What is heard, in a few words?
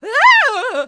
Enemy Generic Human Death Eaten; rampage arcade gamerip 2018